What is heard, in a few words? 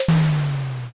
door open